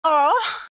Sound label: moanin